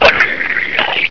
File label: attack, zombie poison; hl2